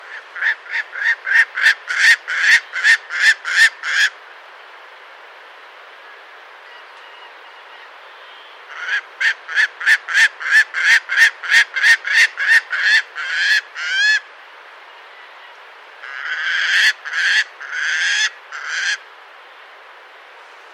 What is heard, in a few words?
Gyrfalcon